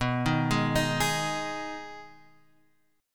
D sharp Suspended 4th Sharp 5th B

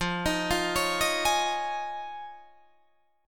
F Minor 7th Sharp 5th Guitar Drop D, chord

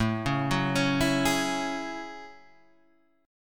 Gb Minor 9th

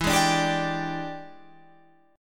E 7th Sharp 9th, Guitar, Open F; E7#9; strummed

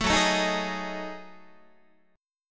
F sharp Minor 6th Add 9th, Guitar; strummed; JGuitar

